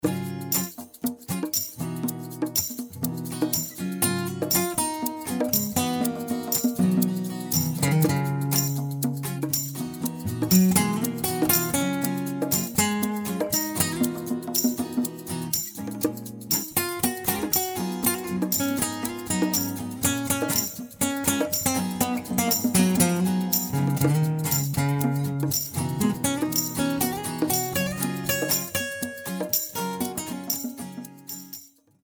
Audix MI250B

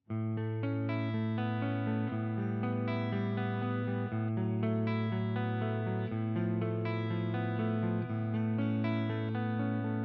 Fusion T clean neck

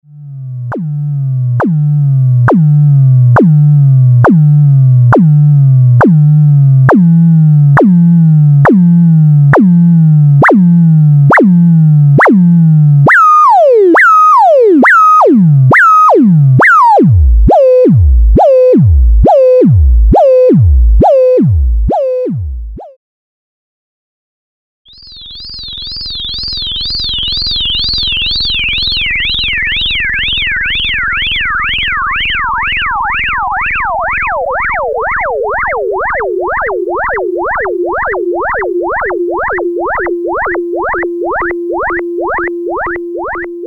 EMS Logik

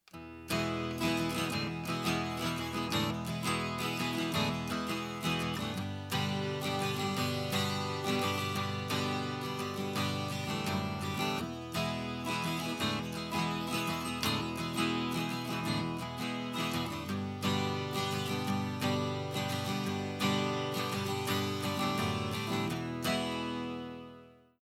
Guit, Preamp

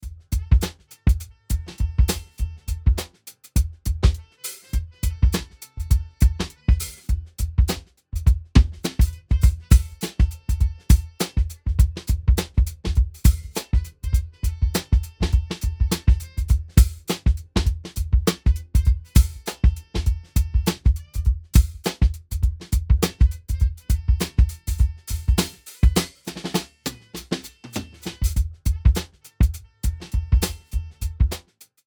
Drum Buss Transients; Ableton

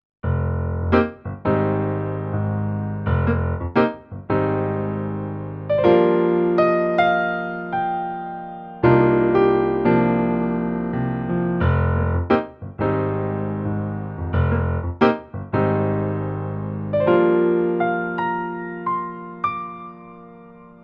Kawai ES8 SK5 Grand Piano

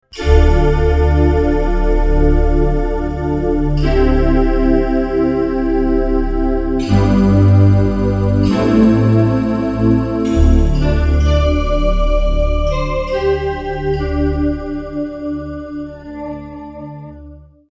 pad

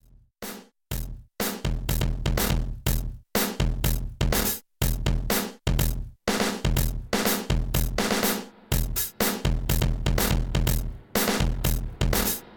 Vermona ReTubeVerb Overdrive

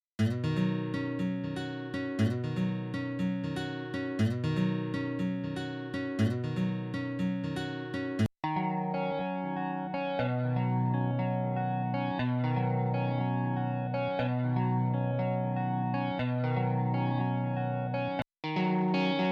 Guitars; Sampletank

